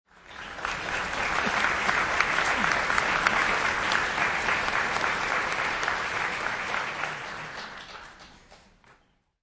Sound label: Concert